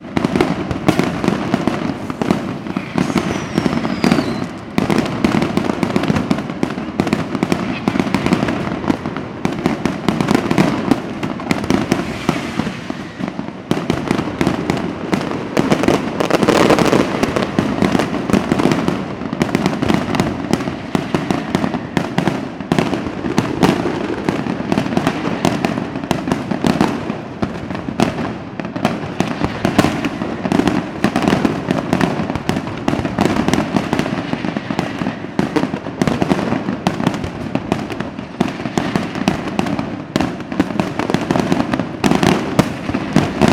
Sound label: fireworks display intense; sound effects one